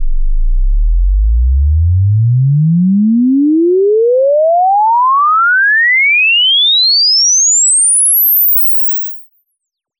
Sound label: sine sweep log; Tones